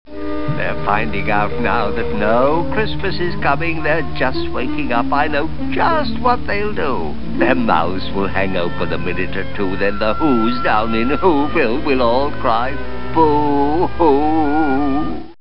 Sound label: boohoo